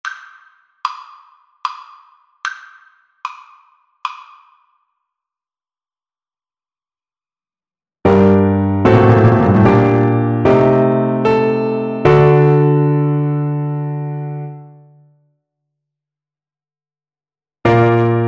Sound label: sonata, Cello; marcello